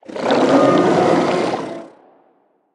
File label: lobby victory growl; smite; poseidon